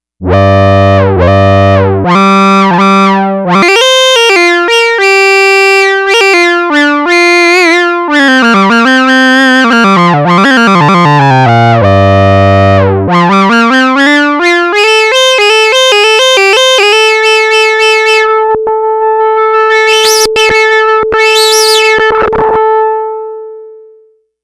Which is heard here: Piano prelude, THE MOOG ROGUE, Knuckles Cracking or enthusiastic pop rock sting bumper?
THE MOOG ROGUE